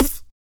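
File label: vox percussion, Vocal Planet Jazz FX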